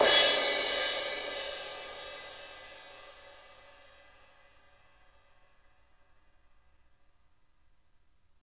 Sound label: Roland MV8800, crash; RIDE CRASH